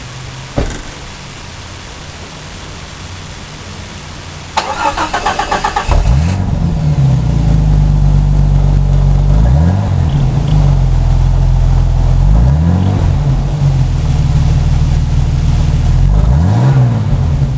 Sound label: muffler door slam startup idle rev at 5ft away, jeep